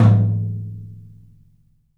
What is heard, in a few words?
TOM, Studio Toms; 3D Percussives